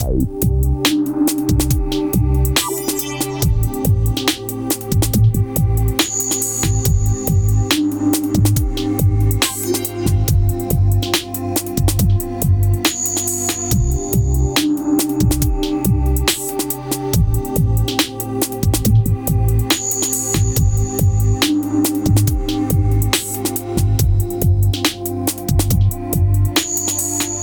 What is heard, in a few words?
Loop Ambient